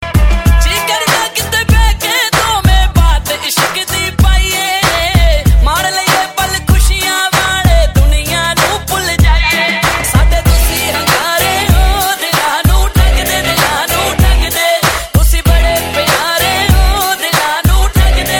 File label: punjabi ringtone